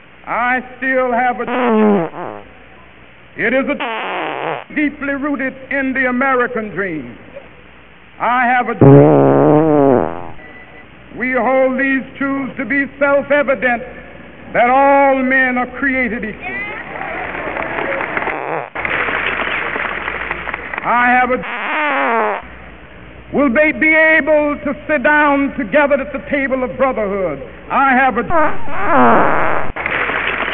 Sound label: dream fart; "The Civil Farts Movement"